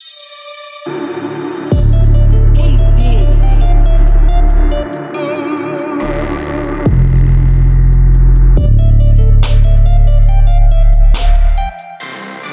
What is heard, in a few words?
Drill Melody